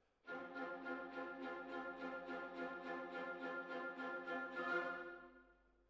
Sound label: Hall, Jazz for piano